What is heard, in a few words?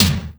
tom low; E-MU Formula 4000 Series Vol. 2; OrbitPercMaster